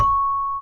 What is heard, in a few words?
Celeste C#4